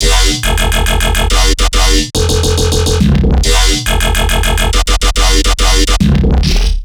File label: Riddim Bass Loop F 140; PiranhaGun; Ghosthack; Riddim Essentials Volume 2